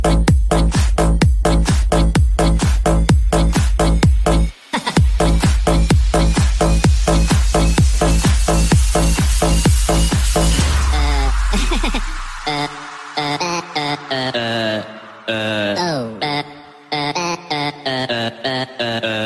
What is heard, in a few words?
funny ringtone; minions; funny ringtones